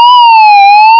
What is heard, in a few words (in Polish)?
syrena